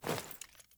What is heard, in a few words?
Foley Action Jump Land; Characters; Player